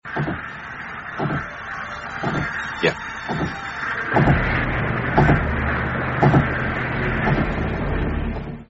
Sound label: sneakers